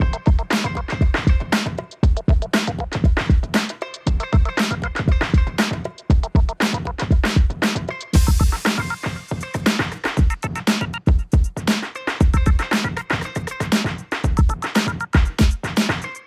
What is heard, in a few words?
90 hiphop 118bpm DRUMS; gamerip; Hot Lava